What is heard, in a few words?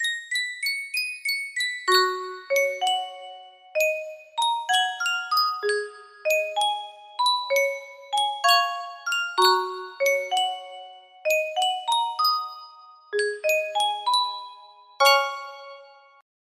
yunsheng music box, melody